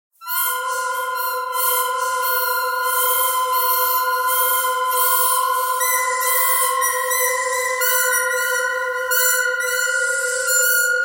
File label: bird canyon bird canyon pentatonic